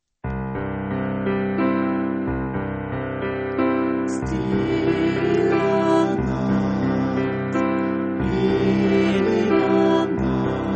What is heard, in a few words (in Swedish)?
Stilla Natt tenor